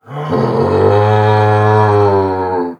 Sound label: idle, male zombie; nmrih; zerosiki; slender